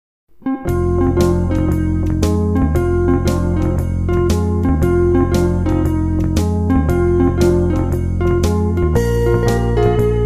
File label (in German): F-dur